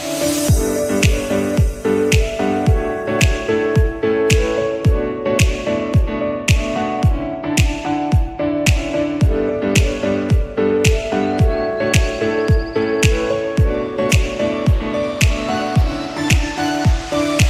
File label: lobby